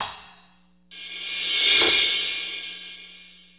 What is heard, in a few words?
Reverse Crash, Cymbals; Drums & Percussion; One Shots Drums